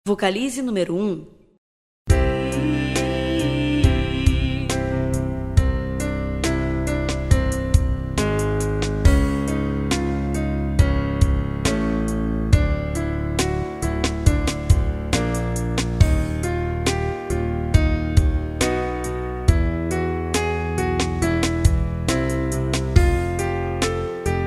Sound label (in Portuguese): sem voz, vocalizes canto; exercicios canto